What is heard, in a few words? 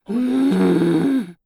female zombie moan